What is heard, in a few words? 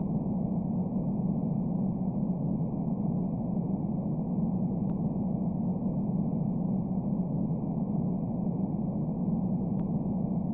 music wind